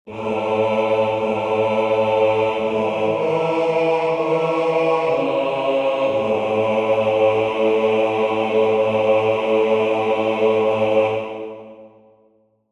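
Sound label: bass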